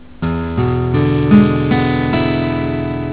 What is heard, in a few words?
chd e7, guitar; They are all basic chords that you will use in many songs